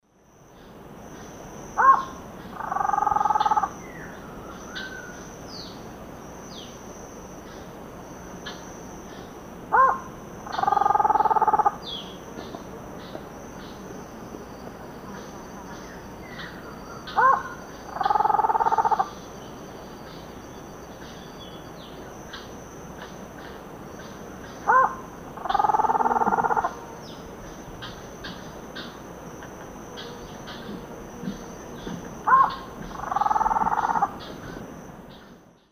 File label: Thamnophilus cryptoleucus, calls; S. America; Eastern Lowlands